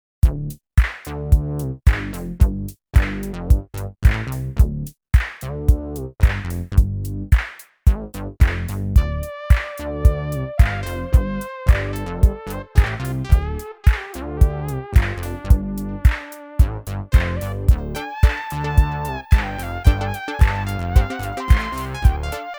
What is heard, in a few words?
volca funk; set these circuits on fire